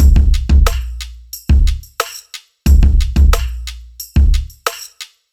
Bounce 90bpm

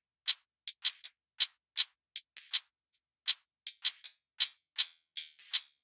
80 Drum Hat

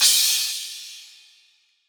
CYMBAL

